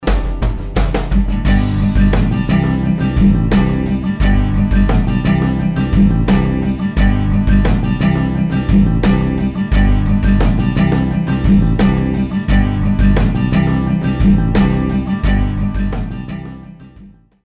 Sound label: music sample contemporary